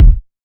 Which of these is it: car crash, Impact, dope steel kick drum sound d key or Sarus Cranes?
dope steel kick drum sound d key